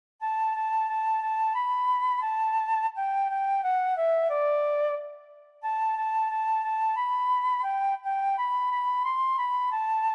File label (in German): O du Armer Judas Tin Whistle; Maestro(Android application)